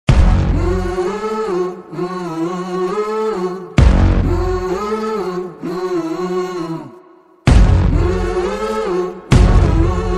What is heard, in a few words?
samsung s10 ringtone